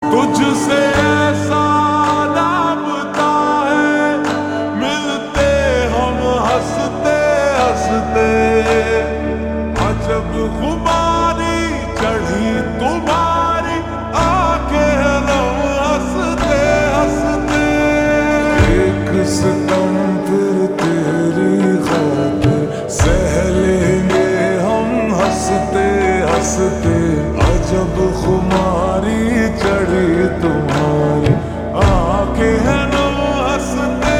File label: Slow Reverb Version Ringtone